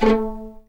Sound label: A3 PIZ F, VLNS PIZZ; East West; The Ultimate String Collection